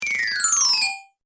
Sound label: Chimes High To Low Fast, Windchime